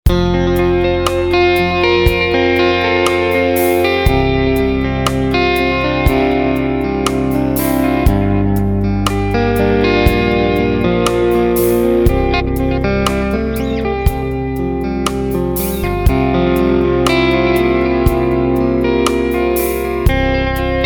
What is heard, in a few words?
Pop